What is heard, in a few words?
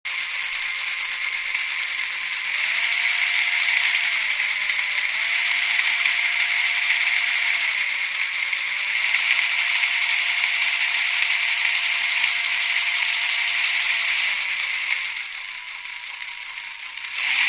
Dc Motor Revs; buzz